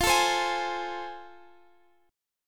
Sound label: A Minor Major 7th Sharp 5th, F